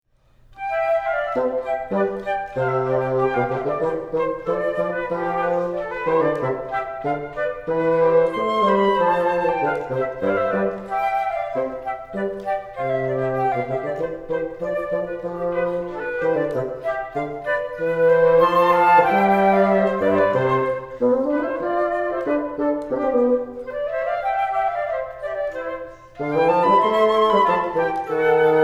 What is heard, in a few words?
trio vi rondo